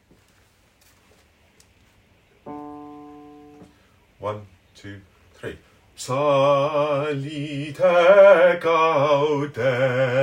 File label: Psallite Gaudentes bass voice